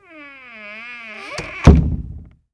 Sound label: WOOD SQUEEK, Doors; 1000fx